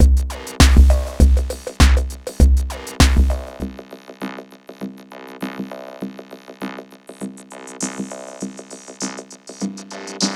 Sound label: vocoder Consonat Freq Filt Dir